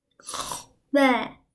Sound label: Cute Vomiting